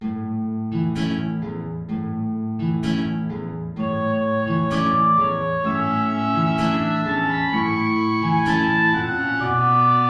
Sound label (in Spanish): tango